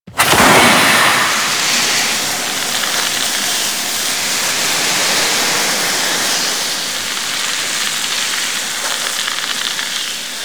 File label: Vent Gas Start; Ambience; Warframe; Corpus Gas City; Gameplay Remaster